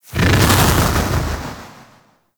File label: Splitter Flip Whoosh; Warframe; Infested; Grey Strain